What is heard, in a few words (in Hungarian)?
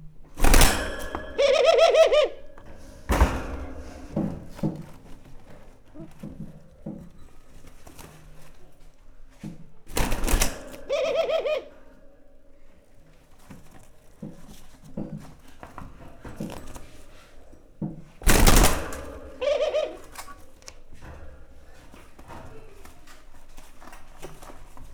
kalitkaban, kacago gerle; állathangok; paraszt udvar